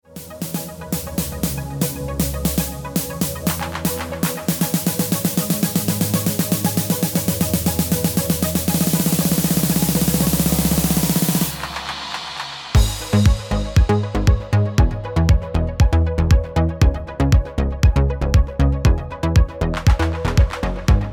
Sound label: Ringtone